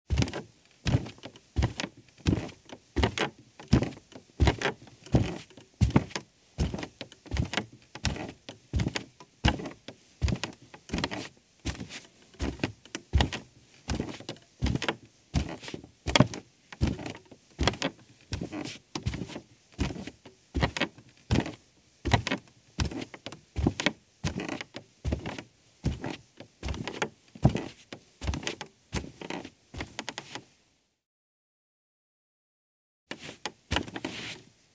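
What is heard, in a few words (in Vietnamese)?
Tiếng bước chân đi trên sàn cầu thang gỗ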